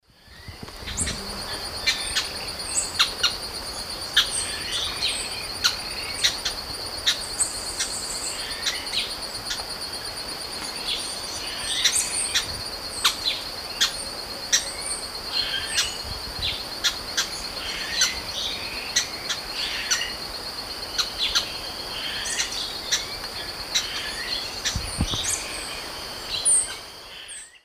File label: Molothrus oryzivorus, calls; S. America; Eastern Lowlands